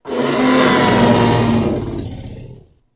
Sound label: Dinosaur Roar Burst